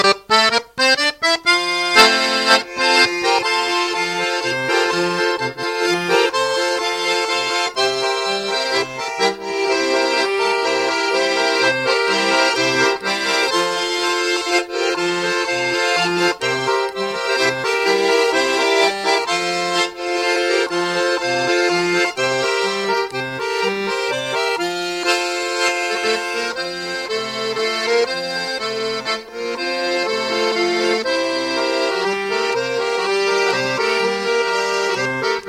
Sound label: Marzurka